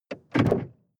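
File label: sports car interior trunk close medium; verkehr transport; autos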